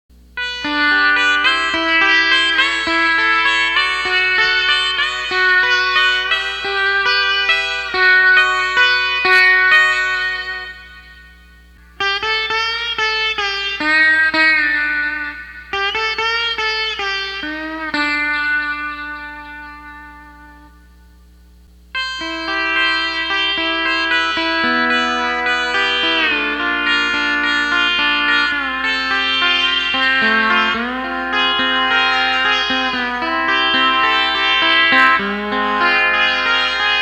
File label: Sitar, Sitar Bar